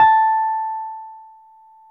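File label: Wurlitzer electric piano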